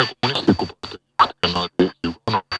Weird Noise; Five Nights at Freddy's; FNAF Sister Location

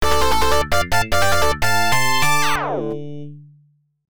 Radio; Burning Point; PC-88

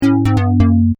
Incorrect; Kahoot

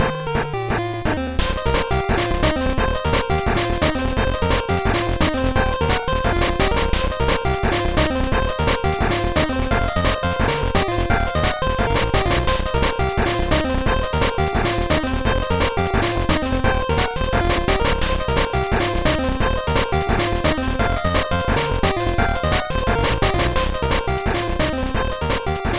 Special Attack ~Chiptune Version~; one piece going baseball kaizoku yakyuu

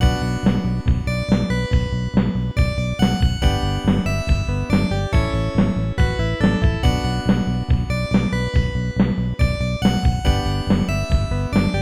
All Clear; Raiden II; arcade

